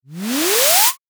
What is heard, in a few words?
tech boot; ui game